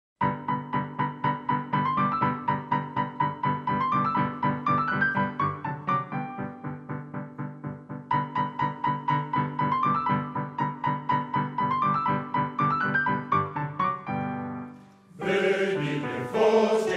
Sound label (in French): Choeur des gitans, choeur hommes; Choeur D'homme de Vannes